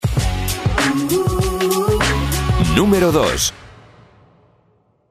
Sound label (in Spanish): jingle